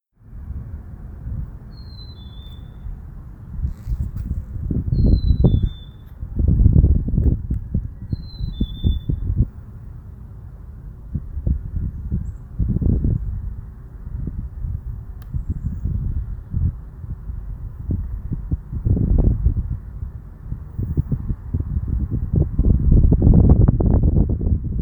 Birdcall

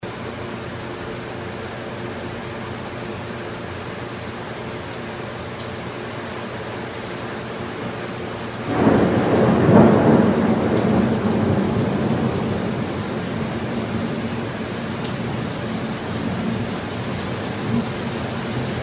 thunderstorm

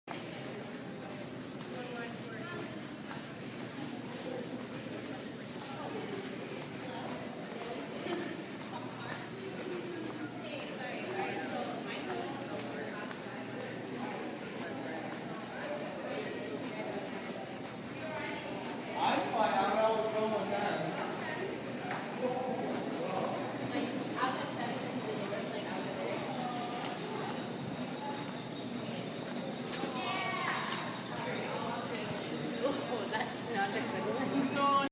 Walking Into The Student Center

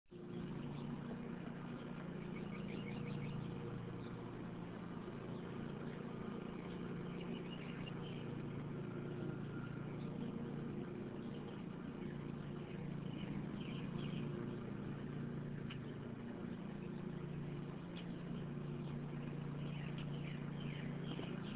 Front Yard, lawn mowers

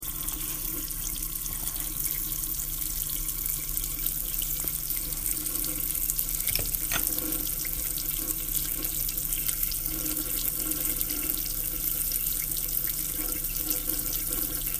Water From A Weak Faucet

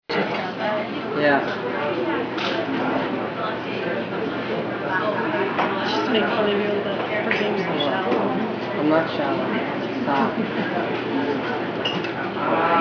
Sushi Ya, restaurant

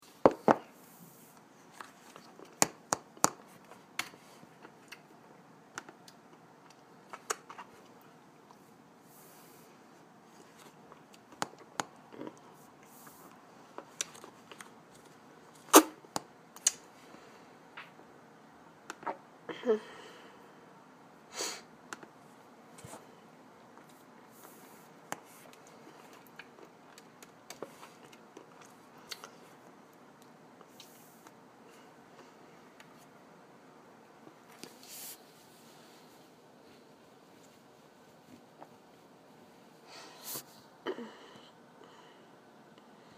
Field Recording My Room; My room, Suffolk Hall 411 at my desk; The sucking of a straw; Field Recording of My Room in the AFternoon

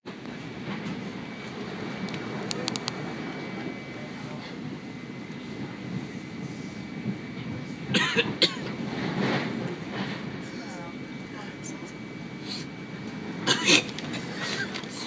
train

